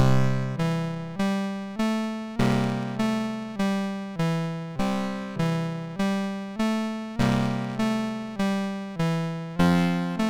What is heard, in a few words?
Classic Foundation Beat of Good Old Rock and Roll, MOS6581R3